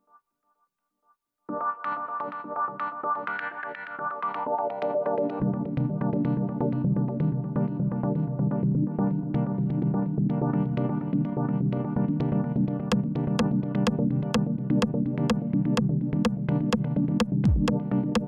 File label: Roland MC-101